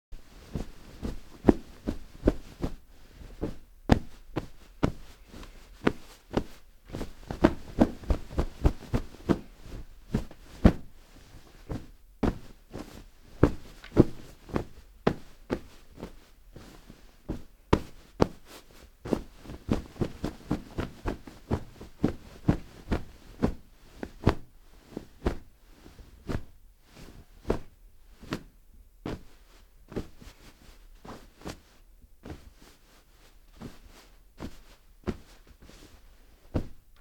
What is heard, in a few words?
Fluffing Of A Pillow